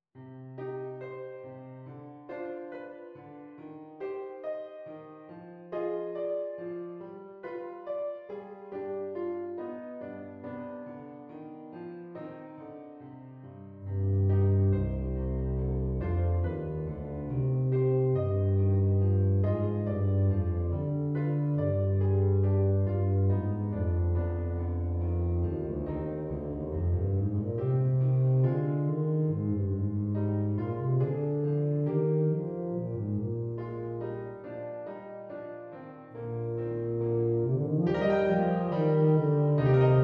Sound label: Beautiful Evening tuba; eb bass or bb bass